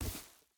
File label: footstep rubber boots grass jump land; Rust